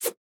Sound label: Dash; boomerang fu